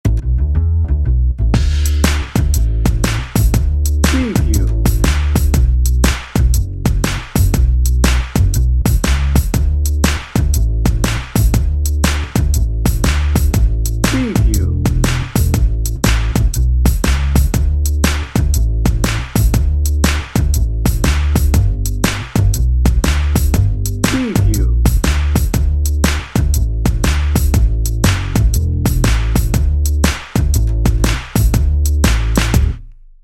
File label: upbeat cheerful 50s pop dnb, Upbeat Cheerful 50s Pop - Loop, Doo Wop